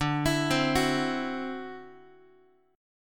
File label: D 9th Guitar, chord; arpeggiated; JGuitar